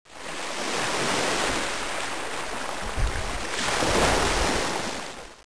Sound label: sea